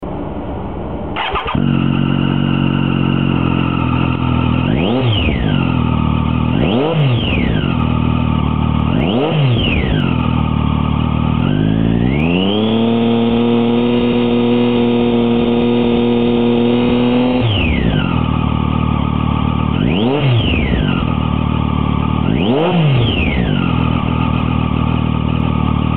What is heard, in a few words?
exhaust sound; yoshimura